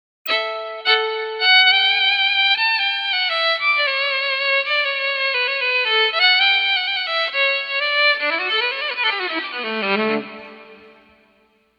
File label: Fiddle; Quilter Steelaire Pro